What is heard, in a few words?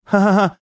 imitating forced laugh